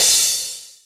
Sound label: soft hit finish